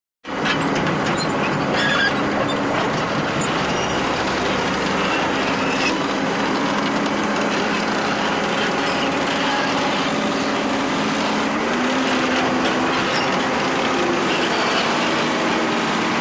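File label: Farm Excavator Tractor; Steady; Farm Excavator Working; Motor Noises, Constant Creaking, Metallic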